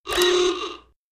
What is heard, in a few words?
Ahoogah Robot, horns